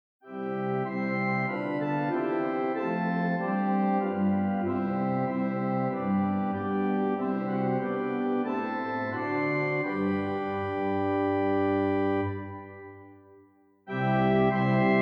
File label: Organ